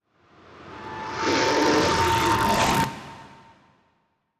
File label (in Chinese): 诡异森林点缀声; ambient